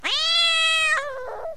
2 syllable shriek 3356Hz; sylvester the cat

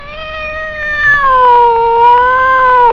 3 syllables increasing howl; sylvester the cat

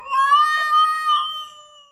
3 syllable mournfull 1221Hz; sylvester the cat

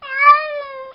2 syllable meow 1167Hz, cat; sylvester the cat

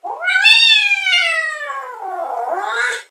4 syllable wer wah ahhh rah; sylvester the cat

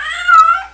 2 syllable sad query 1295Hz; sylvester the cat

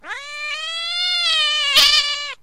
2 syllable shriek interrupted by strike; sylvester the cat

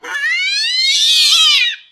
2 syllable escalating shriek; sylvester the cat

